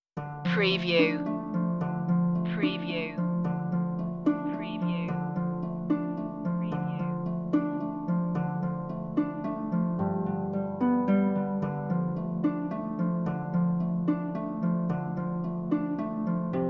medieval tale underscore

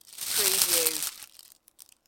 Leaves Rustling

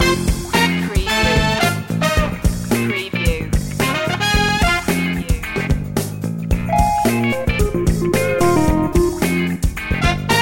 groove loop

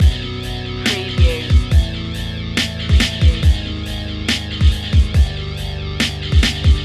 ghost town loop

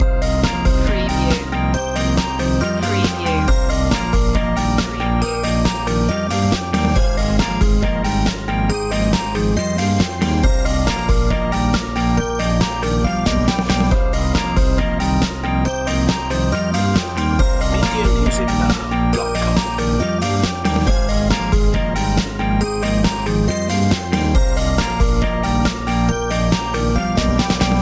electronic loop

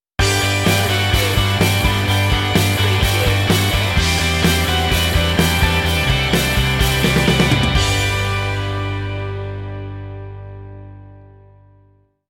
driving force stinger

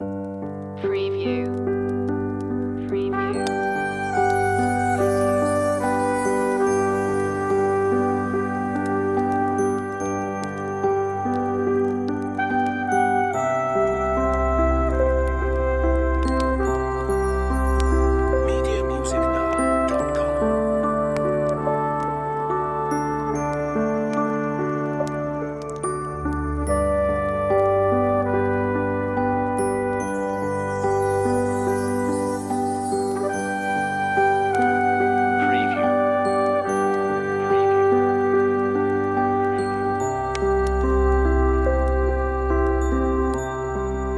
fireside christmas bliss jingle bells

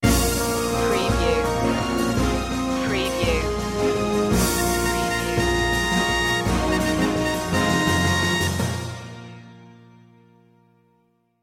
ancient arrival sting

